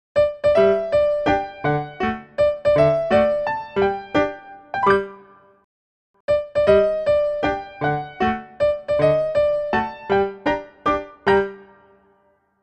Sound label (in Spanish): mayor menor